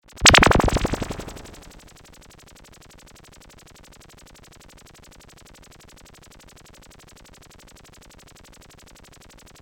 Flash Laser Gun

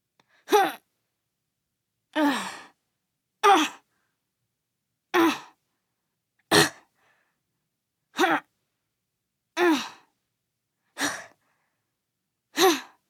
Young Boy Grunts For Body Impact

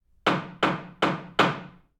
Hammer On Wood

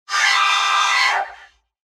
Alien Woman Screams

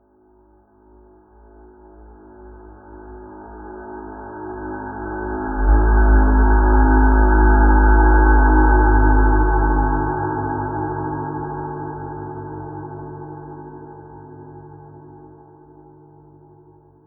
Creepy Horn

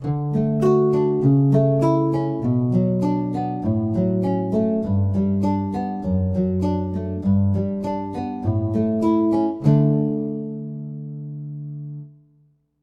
Nylon Kanon D 100bpm

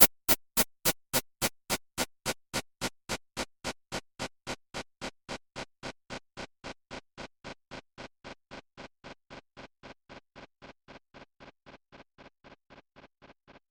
140 Bpm Attack Loop

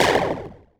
Bump

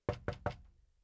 Knock On Wood Fast

